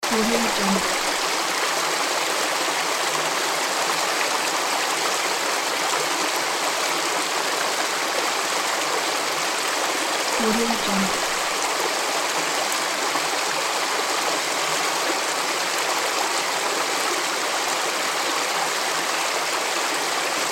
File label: water